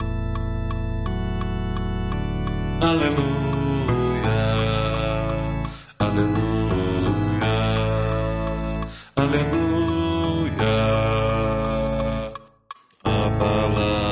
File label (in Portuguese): guia baixo; Aleluia Cardita; Aleluia Angelo Cardita